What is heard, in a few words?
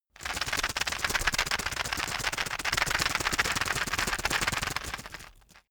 plastic drug blister shake hard